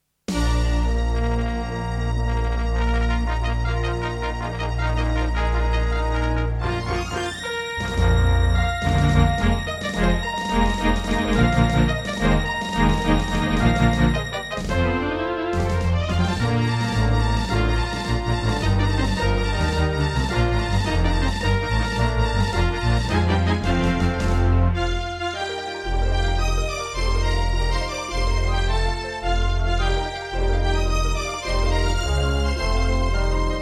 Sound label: Intro, Miditemp DS-48 CleanWave32; Dark Forces